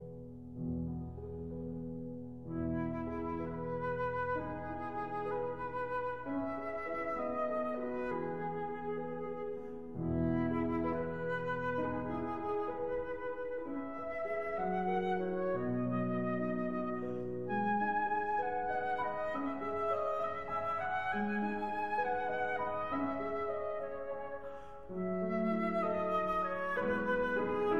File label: Berceuse (Lullaby) for flute and piano; Gaubert, Philippe